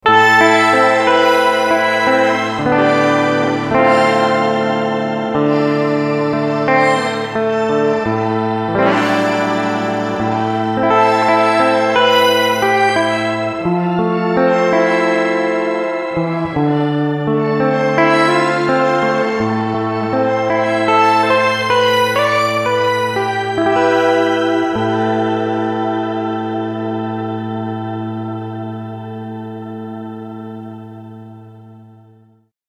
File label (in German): Shimmer Pur